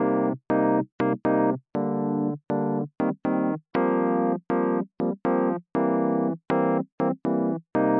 120 rhodes funky melodic; KEYS ORGAN PIANO LOOPS

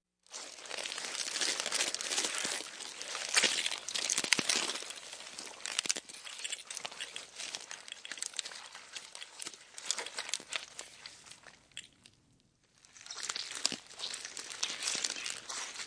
microscopic beetle movements; Zoo and Nature

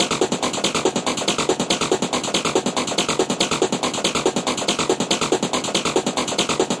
141 rolling around in the crunch, drum loop; 141 to 160 bpm

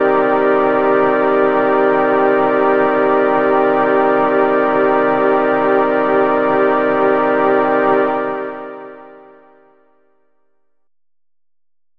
pad transcendent

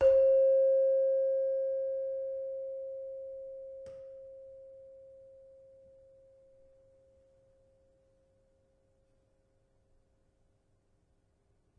Saron, Indonesian Saron; Multisampled; WORLD

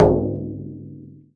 arabic perc, Arabic Percussion Kit

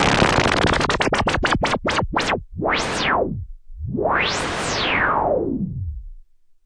noise SH101, Noise Sweeps

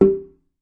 African Wooden Drum Mid; ETHNIC and WORLD PERCUSSION

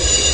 subway sound; Subway Sound Construction Kit

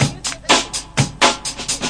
125 bpm, helicopter, drum loops and breaks

